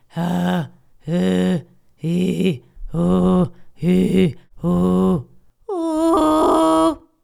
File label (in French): grunt simple; les effets simples